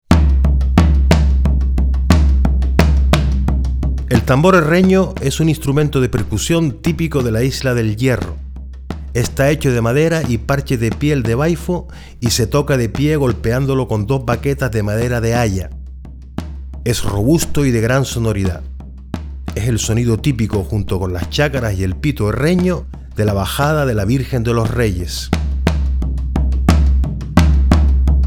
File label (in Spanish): Tambor Herreno